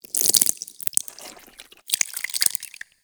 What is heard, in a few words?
Infested Ancient Taunt Flesh; Warframe